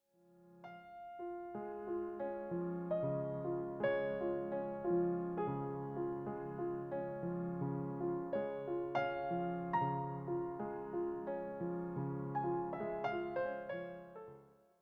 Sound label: Nocturnes In D Flat Major, piano; chopin nocturne selections vol 1